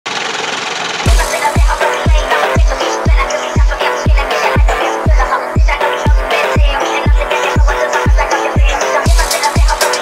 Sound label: phonk